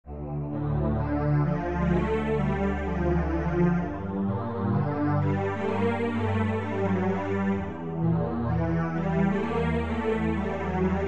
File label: preludes for string orchestra